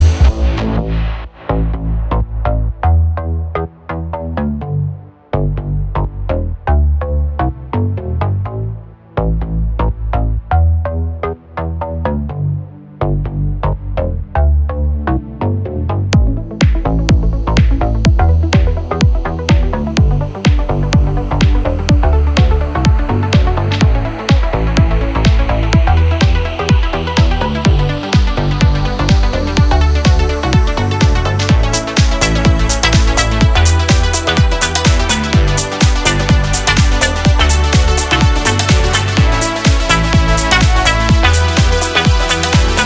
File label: Raindance instrumental